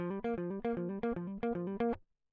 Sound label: electric